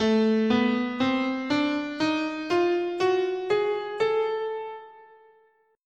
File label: A Diminished Whole Half, scale